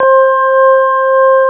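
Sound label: CX3 organ C5 R, Keyboards Old School; USB Soundscan vol.09 - Keyboards Old School; AKAI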